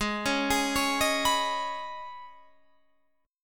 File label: D sharp 7th Suspended 4th Sharp 5th G sharp, Guitar